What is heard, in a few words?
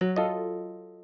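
Instant Messaging Connection Lost; oxygen sounds; plasma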